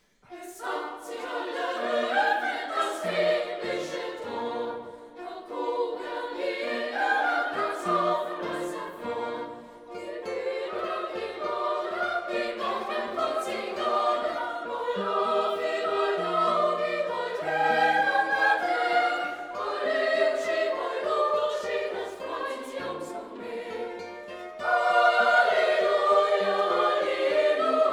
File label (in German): Es hat sich halt eröffnet (Arr. for Choir); Sacred Choral Music